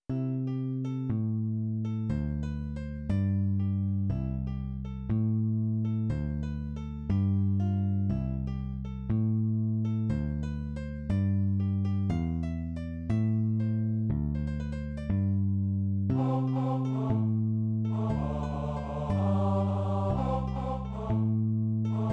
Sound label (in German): Bass